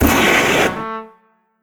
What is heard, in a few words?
trombone; rainblower; tf2